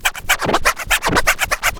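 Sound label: SCRATCH TOOLS; AMG Megabass REMIX! VOL-2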